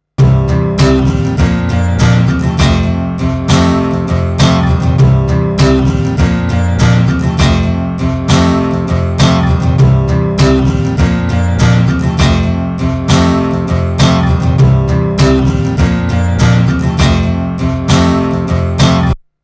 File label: Acoustic Guitar Process